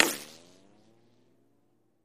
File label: Bullet Ricochet Sin